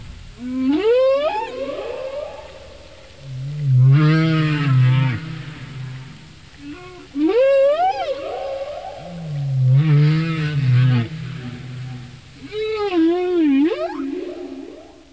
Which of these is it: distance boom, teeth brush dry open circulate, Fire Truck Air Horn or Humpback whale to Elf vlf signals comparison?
Humpback whale to Elf vlf signals comparison